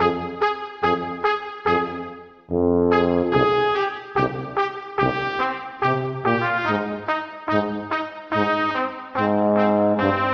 horns, jazz loops

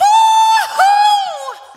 woo hoo; ooh ahh

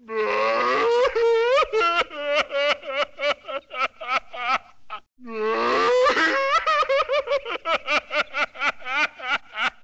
crying

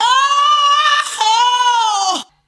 "oh ho" sung; Voice

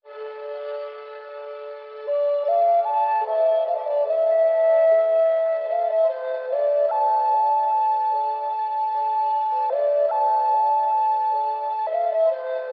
flute, Loops, Sad

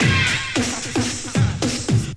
stab, Beatbox, Drum loops

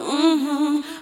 mmm hmm; ooh ahh

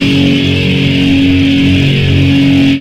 shred, Guitar

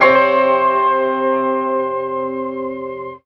flute

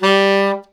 sax; Winds

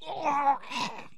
choking; ooh ahh; human

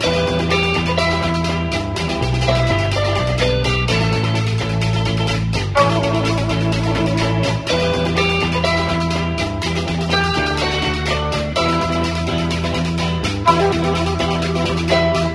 guitar horns peabrain; Loops; Phonky

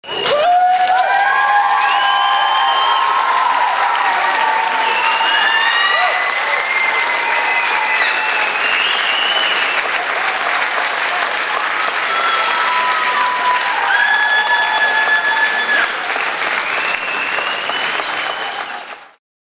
applause

